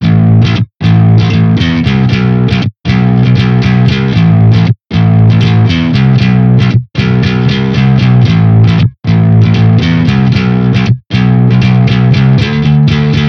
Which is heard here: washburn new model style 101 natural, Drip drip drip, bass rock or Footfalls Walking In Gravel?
bass rock